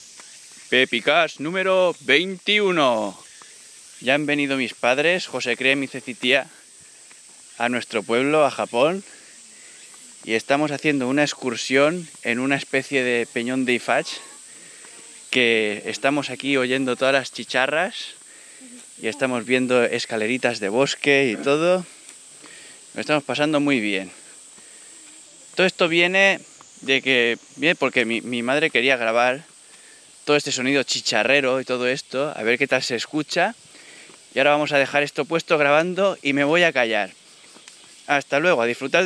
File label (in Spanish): Paisaje sonoro de Keya no Daimon. Chicharras